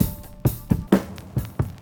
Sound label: dusty, Boombap drum loops